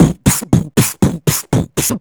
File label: Beatbox Loops, Human Beatbox